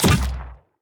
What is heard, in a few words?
heroes basic Attack cast; broden